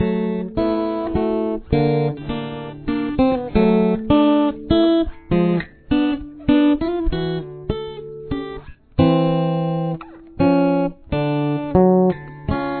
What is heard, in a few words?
outro